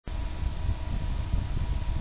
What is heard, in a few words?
a fan; desktop pc